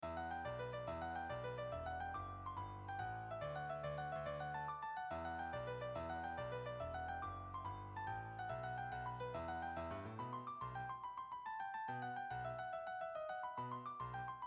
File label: Melody Bass